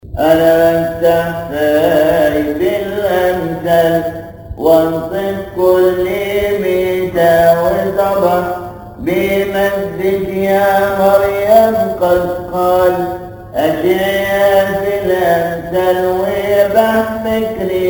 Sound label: Arabic Praise; Ana Aftah Fai; Kiahk; شهر كيهك; تسبحة عشية; مكتبة الألحان; كنيسة الشهيد العظيم مارجرجس بنزلة السمان